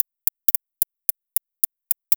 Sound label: Fill, Drums, Eclectic Electronica